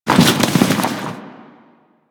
Roll Forward Foley; Warframe; Tenno

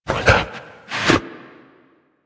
idle alert chew foot, Kubrow; Enemies; Warframe; Tame

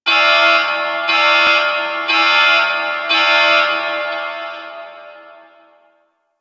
Retrieval Crane Reverse Alarm; Missions

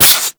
Inflate; Peculiar Inflation Mod

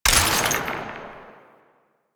Ammo Pickup Blast; Ammo Ability; DE; Warframe; Frumentarius

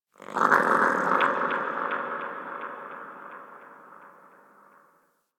Ext Creature Distant Mid; Ambience; Misc Outdoor; Warframe; Infested Eris

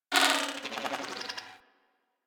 Beep Stutter; Warframe; Ambience; Corpus

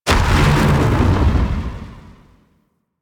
Focus Madurai Cast Burst; Warframe

